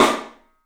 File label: PIC SNR, PICOLO ROOM; 3D Sound